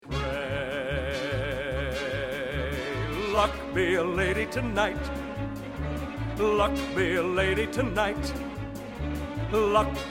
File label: Vocal Guide